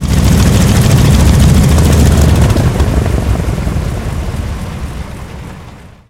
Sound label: engine stop; War Thunder